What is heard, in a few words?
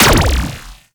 Infested Lich Mindhack Beam Loop Start; Warframe; Abilities; Zeke